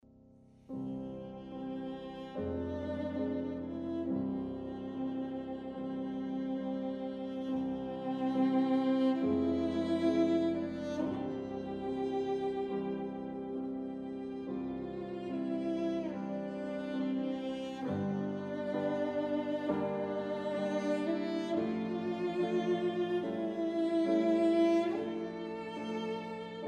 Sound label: piano trio; bargiel; 2nd movt